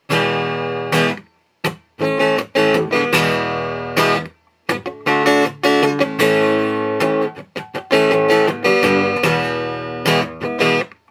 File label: Guild G600 Aspen No Effects; amplifiers